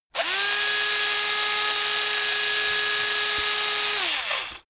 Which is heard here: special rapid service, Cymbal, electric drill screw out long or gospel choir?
electric drill screw out long